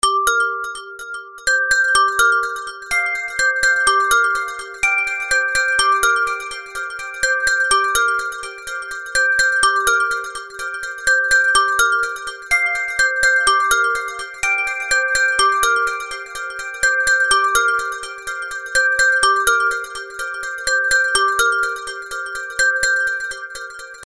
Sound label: Peaceful Night Ringtone